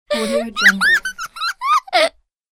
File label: kid giggle laugh; fxprosound